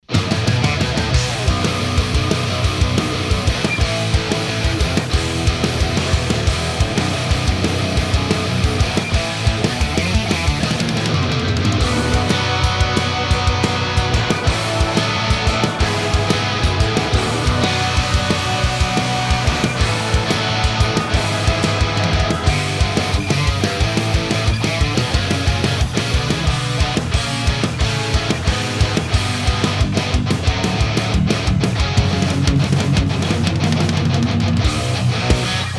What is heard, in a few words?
MIX SAVAGE REAL